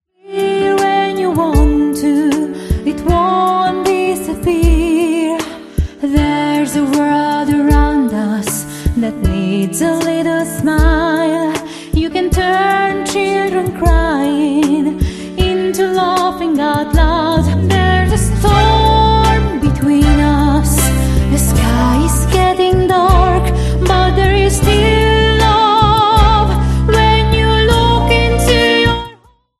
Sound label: voice inside you